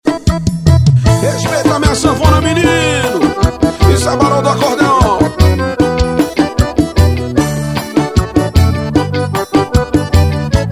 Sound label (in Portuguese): xote